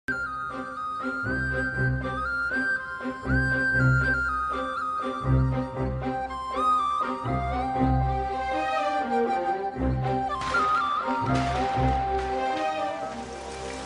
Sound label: telugu bgm ringtone; oye movie